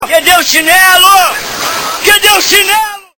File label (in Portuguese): chinelo